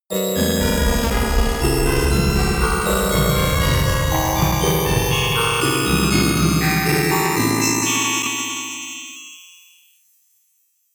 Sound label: horror orchestra long